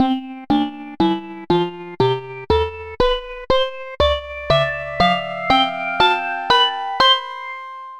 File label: Sine Bell; Retro Noise 3 Instruments